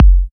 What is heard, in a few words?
Bassdrum